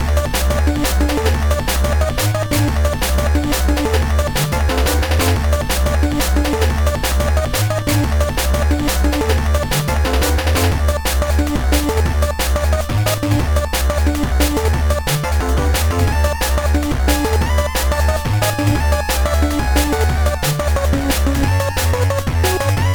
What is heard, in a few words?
BGM; Karnaaj Rally; gba